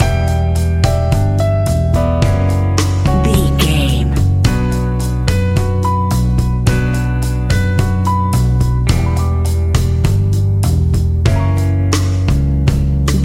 smooth latin jazz music; An exotic and colorful piece of Espanic and Latin music

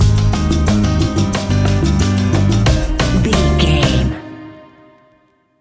Spanish Latin Acoustic Music Stinger; An exotic and colorful piece of Espanic and Latin music

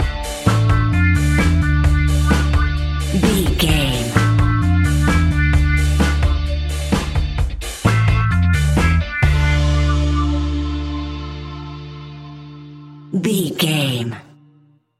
mysterious ska reggae music fusion; Classic reggae music with that skank bounce reggae feeling. Great for

